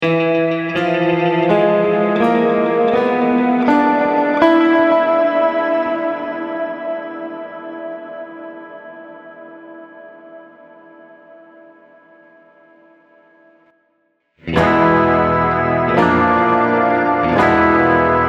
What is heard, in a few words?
Tritone Scale Examples